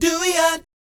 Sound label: DOOEEOT, Vocal Jazz Fx